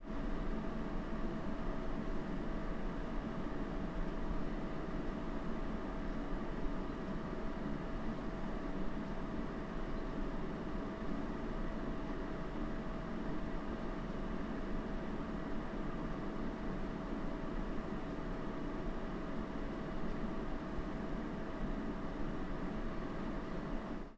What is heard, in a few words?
air condition; devices accessories utilities; NS Studios - ultra sound collection